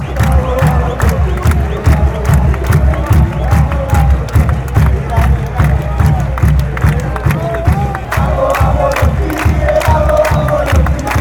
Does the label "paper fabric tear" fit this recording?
No